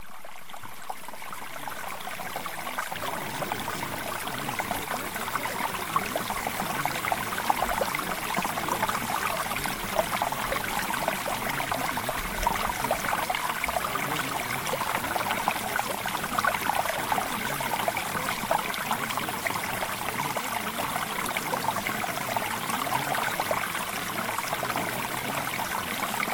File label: Mountain Stream